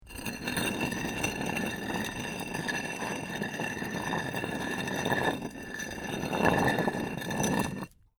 Stone Drag Rock Grind; A rock, cynder, brick or stone slab drags along an even rock surface; Rock Sound Effects

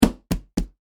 Foley Door Knock Door With Palm; Knocks; Objects

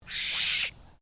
Bird Apostle Bird Call; Various Apostle birds; Animals

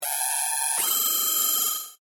Scanner Beam Ray, Scifi; Science, Fiction; Tech; BlastWave

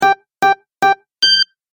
Race Countdown Beeps Fast; Beep Sound Effects

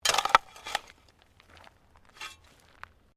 Digging Shovel Mulch; Various digging tools and processes; Construction Sound Effects

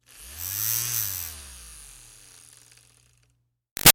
Fan Small Handheld Rev Short; Electronics

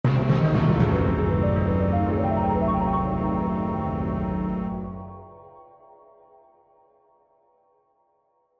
Accent Dark Eerie; Musical Sound Design; Blastwave

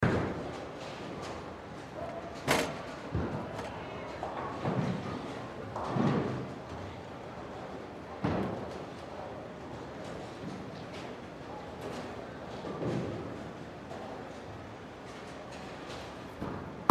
Bowling Alley; Bowling Alley Light Activity Loop; Bowling (Sound Effects:Sports); Blastwave

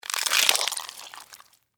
Insect Squish, Guts, Footstep, On, Bug; Animal; Extermination

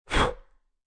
Human, Blowing Out Candle, Male; Breathing

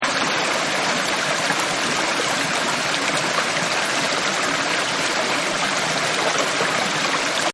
Stream Fast Deep; Rivers And Streams; Partners In Rhyme

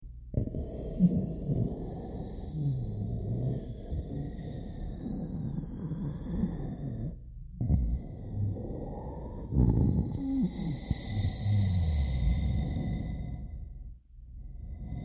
Monster Horror Heavy Snoring Guttural Raspy; Horror Scary Evil Dark Ominous; Scary Breathing; Scary Breathing (Sound Effects:Horror)

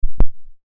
Speaker Power Off; Entertainment System; Technology; Entertainment Systems; Electronics; Blastwave

